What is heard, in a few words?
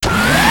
circle saw wind up; tf2